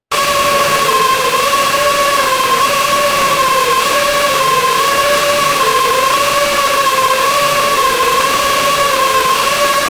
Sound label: danger sound